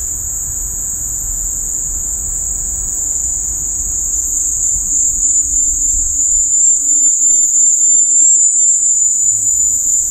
swamp sound bird singing

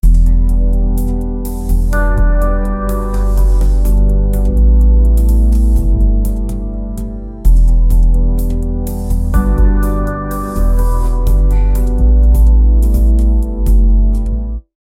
lo-fi with soft bass

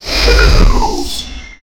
tormentor demon dying, dark fantasy

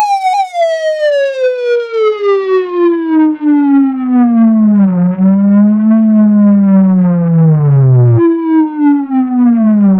the thrilling sound of ed, thriller

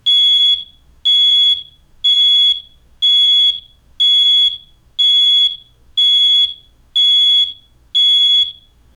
the high pitched beep of a smoke detector; safety

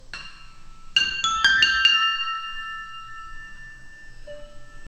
live piano performance; atmosphere

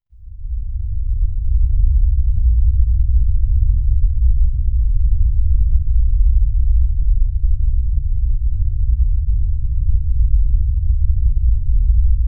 a visceral soundscape fea, ambient wind

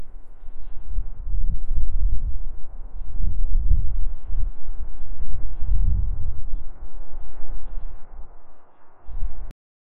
slow rubab plucking; ethnic

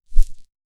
rolling seaweed wrap sound, culinary

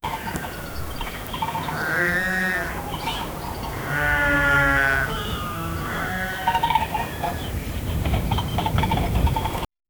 the sound of livestock do

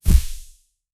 short fantasy impact soun; air burst

